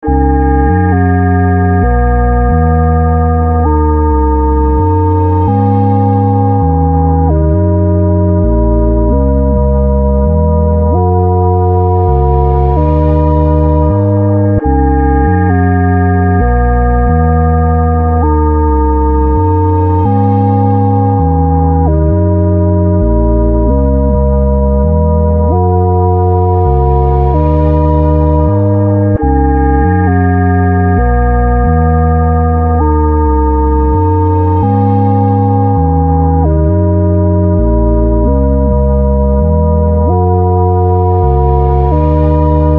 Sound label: Lone Sine